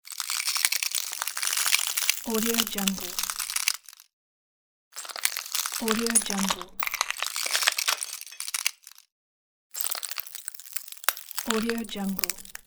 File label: glass cracking; floraphonic